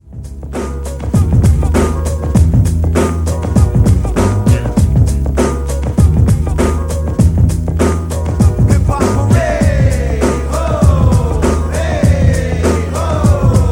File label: Hip Hop